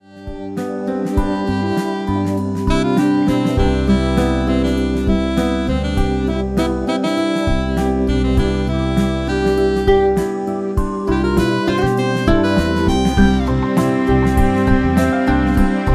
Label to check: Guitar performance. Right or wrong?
Right